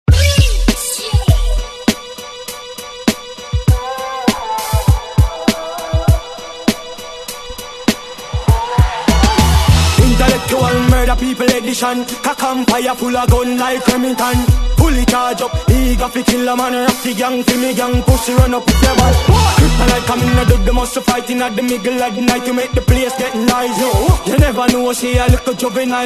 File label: HIP HOP MASHUP